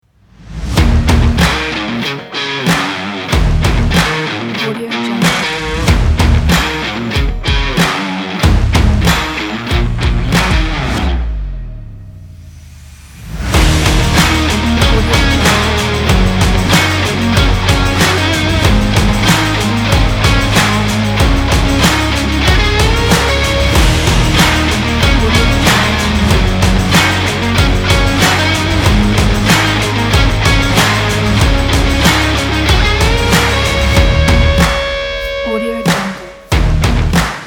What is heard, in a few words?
uplifting rock